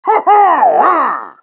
pyro highfive success taunts

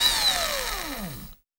performance editor closed; tf2; garrysmod

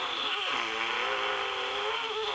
critter idle growling loop; nightmare criters

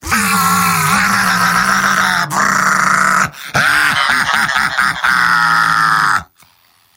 yell; heavy; mvm

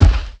large steps; the monster plane; kolmods steps

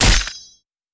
cleaver hit; tf2

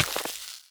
freeze hurt; cauldron frozen lava pack

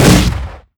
giant demoman grenade shoot; Garry's Mod; tf2; mvm

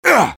sniper pain sharp; vo

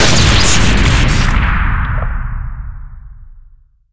sniper railgun charged shot crit, weapons; tf2

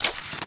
trade up panel slide

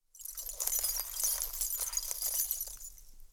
walk; the monster plane

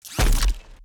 step; tungtung phase2

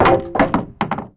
item wood pole drop

